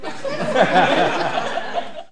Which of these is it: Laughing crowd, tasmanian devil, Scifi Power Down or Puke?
Laughing crowd